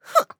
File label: Mage Grunt Slow; Realm Royale; Radon Flux